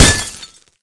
bottle broken hit world, weapons; tf

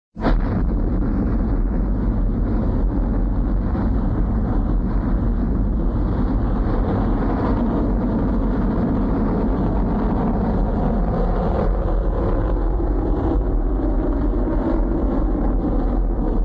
sci fi titan rocket blast off; cartoon

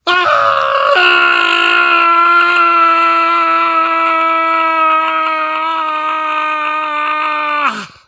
fall, survivor voice; source engine; left 4 dead 2; namvet

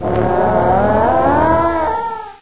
child die; video game; source engine; hl1; gonarch

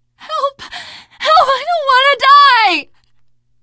dying, teengirl survivor voice; source engine; left 4 dead 2

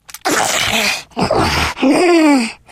spitter lurk, voice idle; source engine; left 4 dead 2

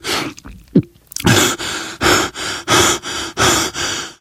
exertion major, survivor voice, manager; source engine; left 4 dead 2

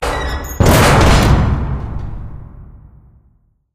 train windows open; video games; source engine; portal2

